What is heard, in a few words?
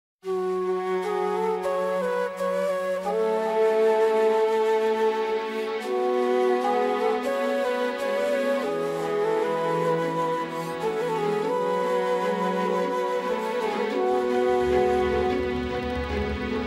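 flute; zhizn pi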